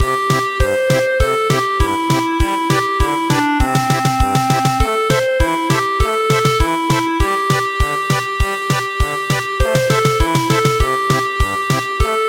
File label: German Polka, traditional; The Melody of Purple Bamboo